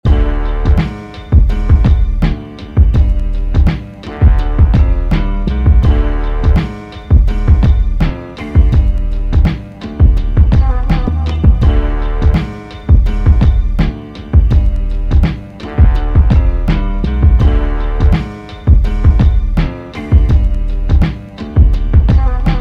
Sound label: Blues